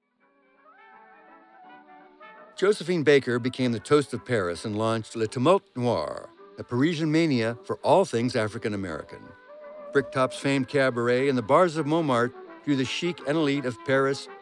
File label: Early jazz in Paris